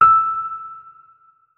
notes, keyboard, electric piano

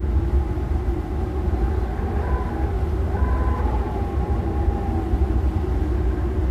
flash idle, anomalies; Dynamic Anomalies Overhaul; eugenium anomaly

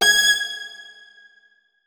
notes, keyboard; Hideout Furniture

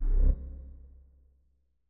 flute notes; Hideout Furniture

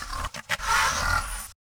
idle, monsters chimera; Soundscape Overhaul

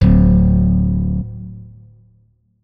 notes, guitar; Hideout Furniture

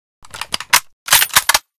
unjam, weapons; SV98 TRG42 Reanimation; librarian trg42

